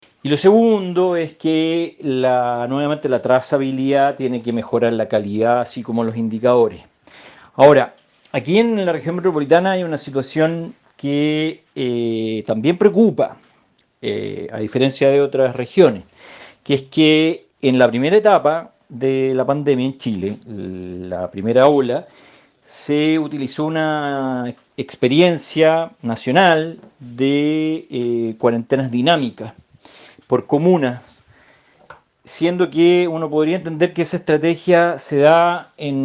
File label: BASS